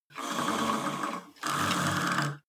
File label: cable machine old pull release creak, fitnessstudio; sport freizeit